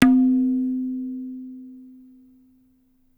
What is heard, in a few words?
tabla; Dirt-Samples